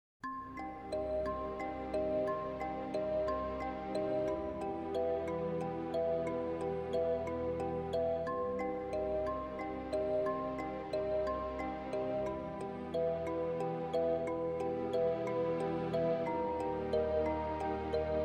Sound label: kalimba